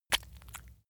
leather impact mud medium

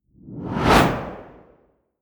swoosh

